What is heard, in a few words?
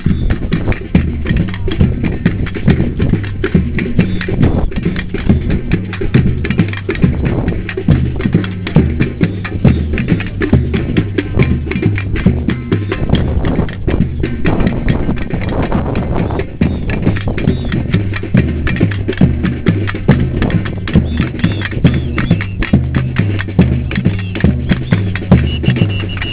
drums